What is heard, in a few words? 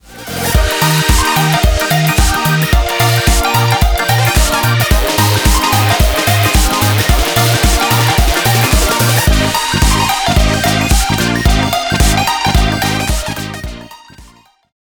pop sequence; Sixtar Gate Startrail